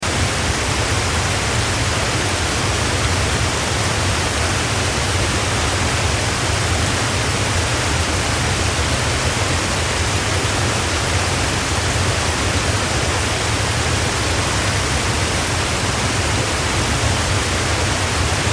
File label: Waterfall River, Waterfall River Run Flow Loop; Large Rumble; Nature; Blastwave